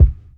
round kick one shot d key, kick sample tuned to the D note. Loudest frequency: 84Hz